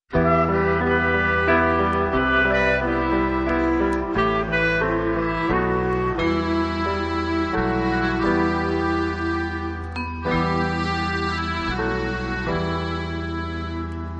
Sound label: romantic melody